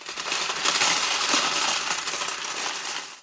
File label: Lego box full of Legos moving and shaking